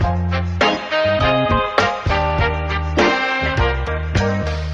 horns, Loops Phonky

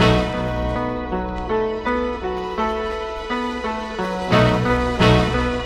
piano, Phonky loops

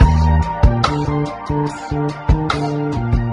keys, Phonky loops

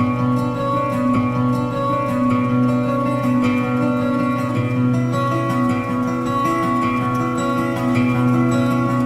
guitar, Sad

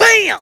bam; Voice; ooh ahh

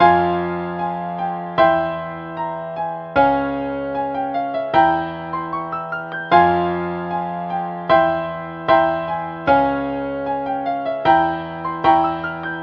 piano, Loops Sad

